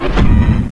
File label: stomp; endboss